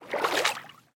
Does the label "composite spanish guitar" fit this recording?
No